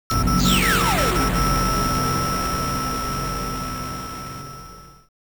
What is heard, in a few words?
Timeout; Metroid Prime Hunters; Single Cart